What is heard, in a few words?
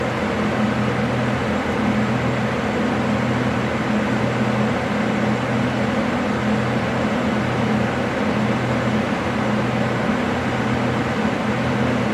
charger, Supercharger